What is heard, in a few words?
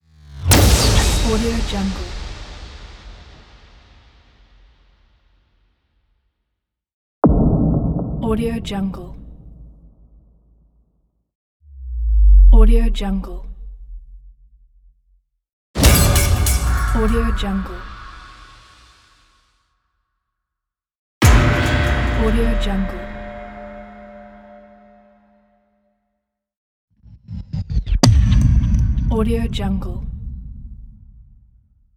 cinematic punchy impacts